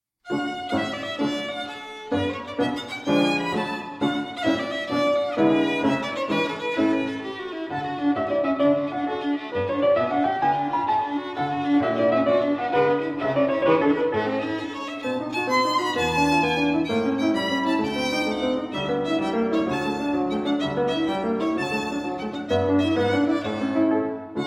Violin Sonata No. 1 in D Major, III. Allegro Vivace